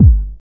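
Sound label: BD, bass drum; X-Static Goldmine 1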